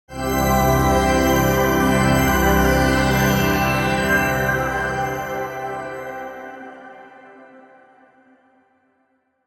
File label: Bright Angelic Synth Pad Hit Fade Out; Ni Sound; Timeless Logos